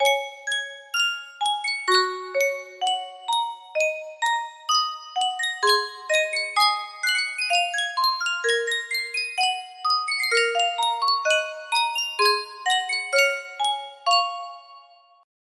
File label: Sankyo Music Box, music box melody